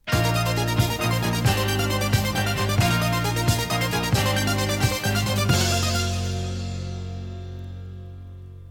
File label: topformat maxi jingle single